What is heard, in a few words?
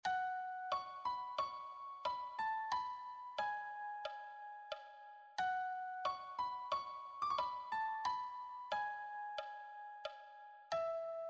solo, piano